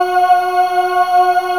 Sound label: ORGAN WAVE, Breathy Organ; E-MU Producer Series Vol. 2 – More Studio Essentials